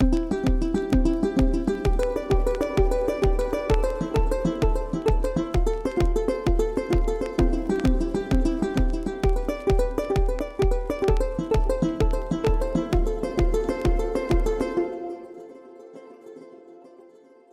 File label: Chords; Axis